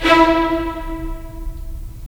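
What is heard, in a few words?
strings violins, left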